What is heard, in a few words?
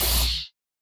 lightsword hits; soundscrate